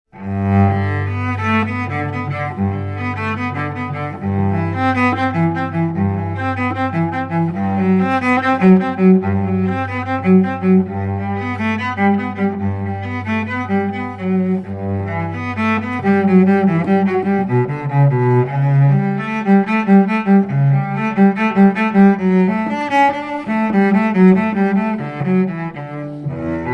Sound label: Prelude; Bach